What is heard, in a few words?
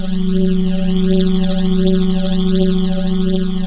Warp Portal Loop